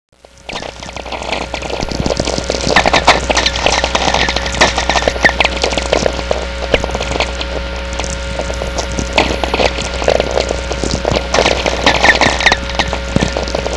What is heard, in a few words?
tweeks; Natural VLF Radio Emissions